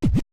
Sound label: scratch; Roland MC-909